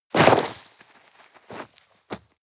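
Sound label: step gravel slip down debris short, footsteps; schritte; menschen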